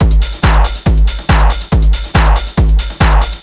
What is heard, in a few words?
Hard D, Techno Drums; TechnoMusicMaker